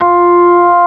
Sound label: ORG F4; B3 FULL